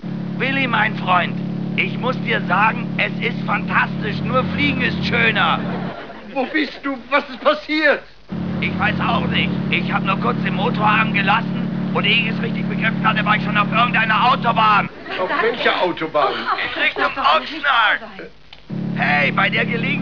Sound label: ferrari